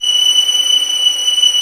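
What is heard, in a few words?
Vio legato F#6; Digital Sound Factory Virtuoso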